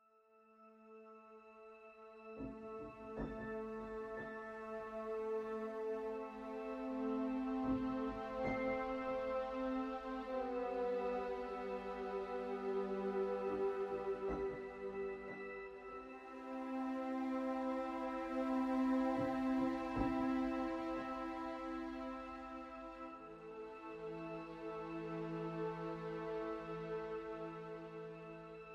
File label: Silence Pad Accent; Historical Places (Magnificent Castles & Palaces)